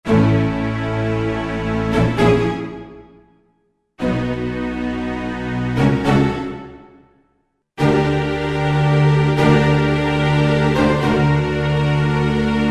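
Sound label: Agitato Cellos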